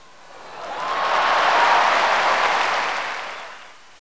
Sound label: Cheer; Intel Createshare; PC Camera Games; Shoop